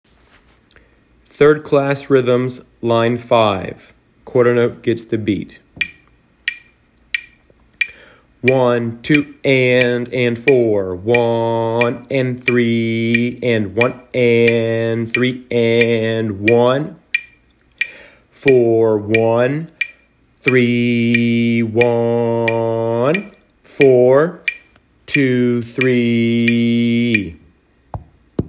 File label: rhythms